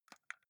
console controller xbox arrow key press slow

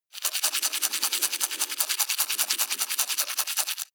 teeth brush dry open circulate fast

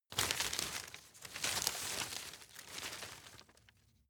grab rustle medium

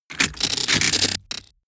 sci-fi electromechanics small stutter

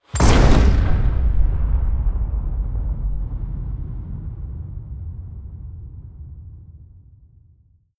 shotgun fire huge reverb bolt

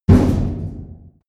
big metal impact short

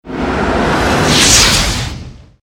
agressive swoshes high short

